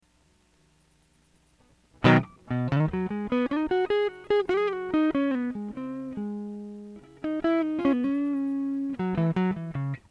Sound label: rock